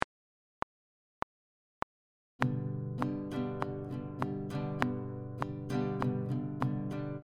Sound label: Easy strumming pattern, guitar